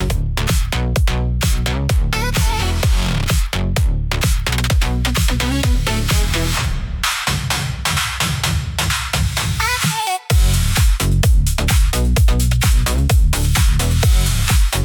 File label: loop; bgm; opening